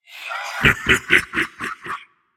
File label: laugh; smite; ravana